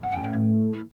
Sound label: musical instrument